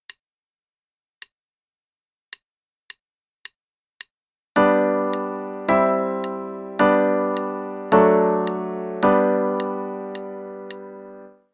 G Major chord progression; CM Level 2

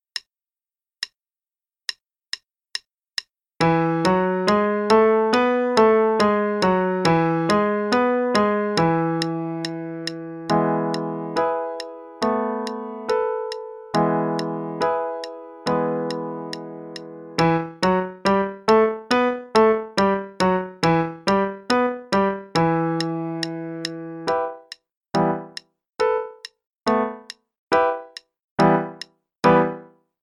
Warm-up in E (Major then minor, qn=140); minors are staccaco; Faber; Level 2A, 2nd Ed p.63 - E Major, then e minor

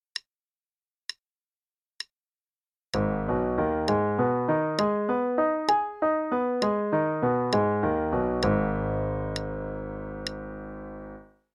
Arpeggios, g sharp minor (qn=66); CM Level 6

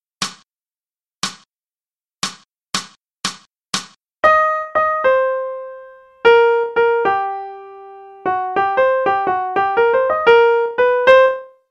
Transcribing Rhythms; swinging

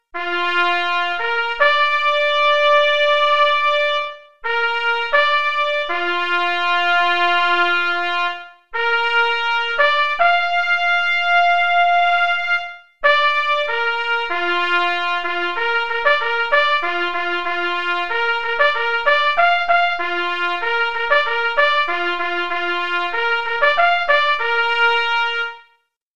Bugle Call Church Call

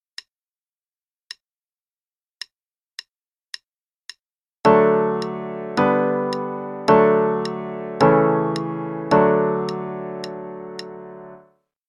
D Major chord progression; CM Level 2

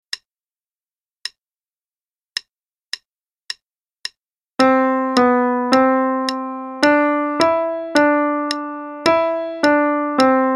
Warm-up with 2nds, qn=108; Faber and Faber; Adult Level 1

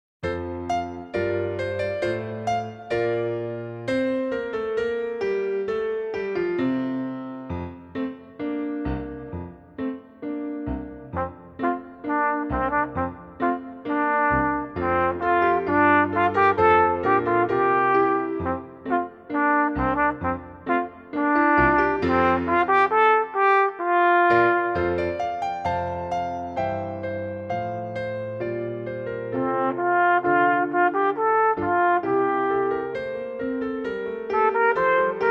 March Majestic (qn=132), trumpet

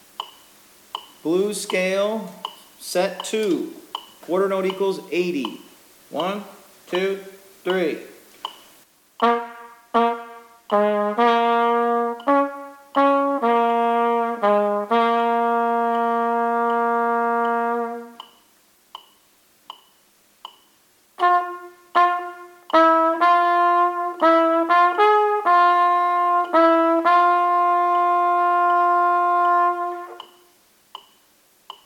Blues Scale (qn=80); trumpet with click; Chwalik; Playing the C Blues Scale, Set 2; lessons Trumpet

